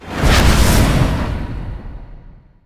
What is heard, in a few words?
Fel Cannon Attack